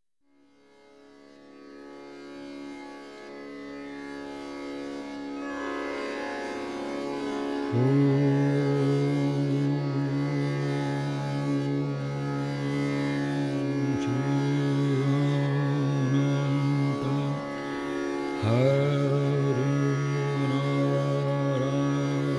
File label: Raga Gaud Malhar Ektaal Drut